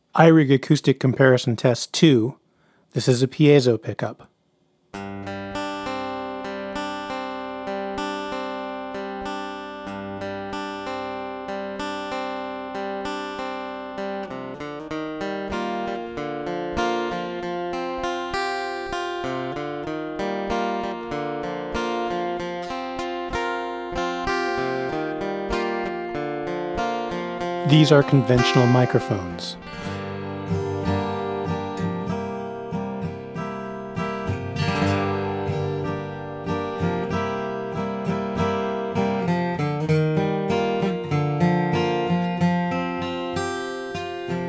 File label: iRig Acoustic Comparison Gentle